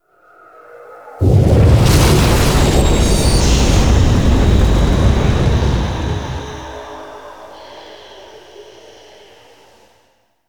solar flare; Warframe; The New War

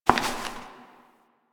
Step Perforated Metal; Warframe; Koumei

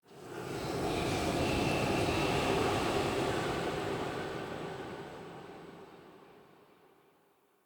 Secret Uncovered Whisper Long; Puzzles

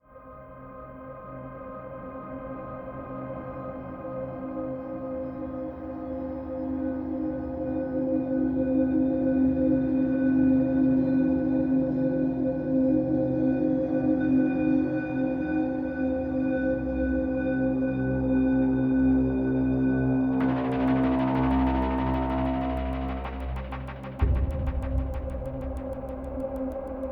Hubs Hangar Amb Cue C; Tenno Hangar

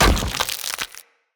Acorn Impact Dirt; Enemy; Characters; Abilities; Mendicant; Mnd Wazard

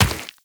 Run Rock, Footsteps; Warframe; Tenno

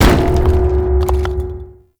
Gatling Gun Debris Impact; Gameplay; Warframe; Tau

